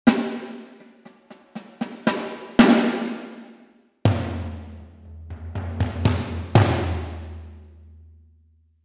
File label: Orchestra High Percussion; Spitfire Audio Abbey Road; Vergleich Orchestral Tools Berlin Percussion